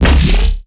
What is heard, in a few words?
Riddim Bass Hit; One-Shots; Bass Hits; Reload; Ghosthack; Riddim Essentials Volume 2